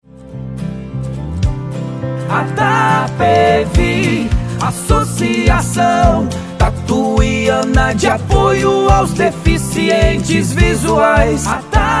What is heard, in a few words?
Jingle; ATAPEVI